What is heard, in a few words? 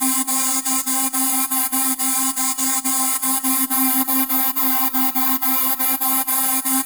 Arp 140bpm C, Trance; Zero-G Trance Inducer; Arps 140bpm; 90 s Sample CDs